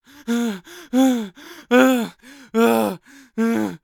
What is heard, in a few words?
npc male scared; DeadFright